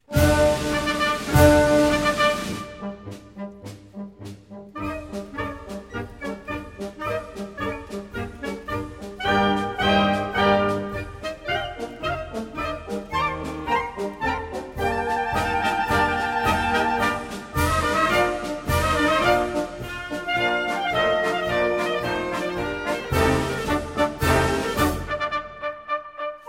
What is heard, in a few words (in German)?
persischer marsch